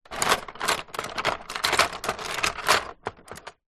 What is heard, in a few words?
household plastic and wood cutlery movement in drawer